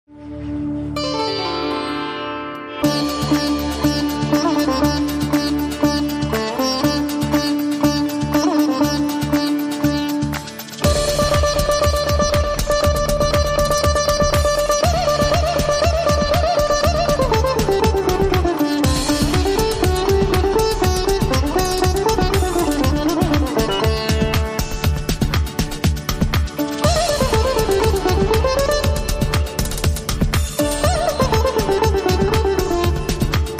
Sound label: Fusion